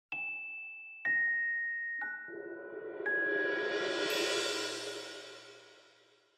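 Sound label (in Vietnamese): Âm thanh xuất hiện logo tiêu đề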